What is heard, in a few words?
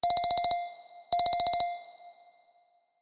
ringtone